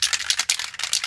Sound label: shake